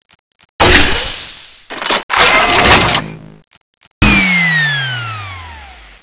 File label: plasma reload; Zombie Plague